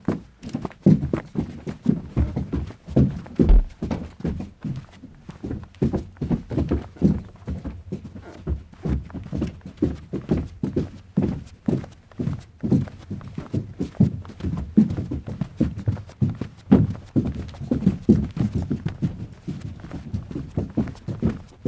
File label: people entering a small theater